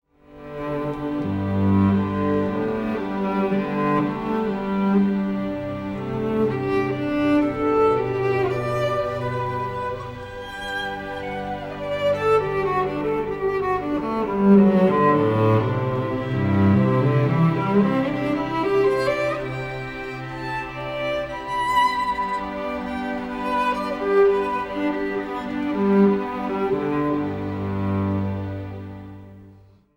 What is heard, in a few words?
FIRST MOVEMENT